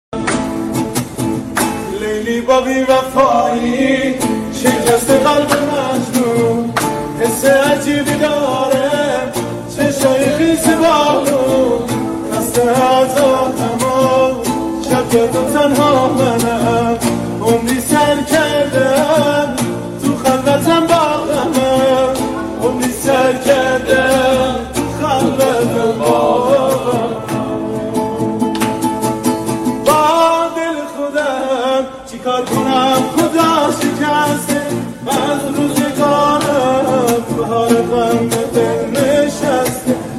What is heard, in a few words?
Guitar